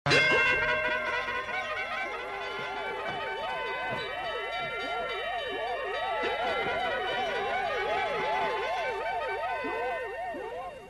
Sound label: 3 seconds win alarm